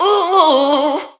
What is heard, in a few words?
"ooh ooh ooh" sung; ooh ahh